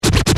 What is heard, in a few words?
Scratch